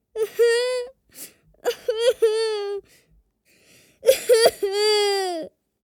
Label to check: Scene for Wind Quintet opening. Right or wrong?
Wrong